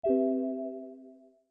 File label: windows 8 background